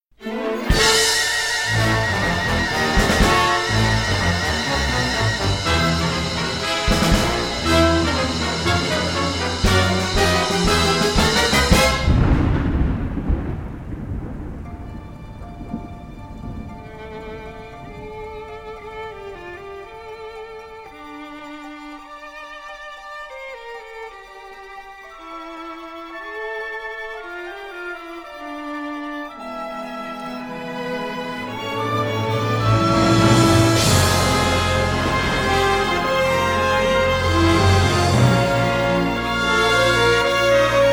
Overture Orchestra

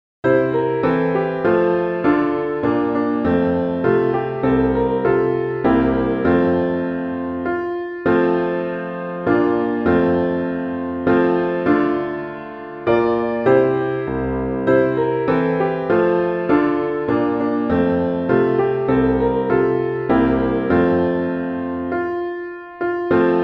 Piano

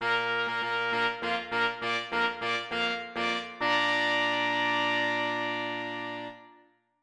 Fanfare

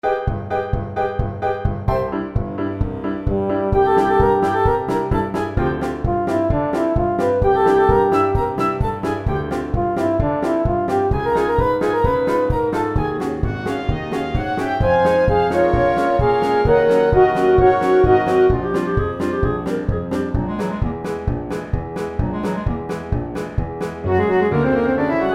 Orch

